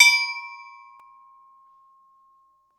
Small Brass Bell

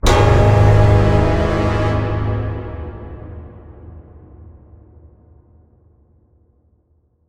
Horror Sting The Pact Is Made

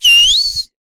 Whistle

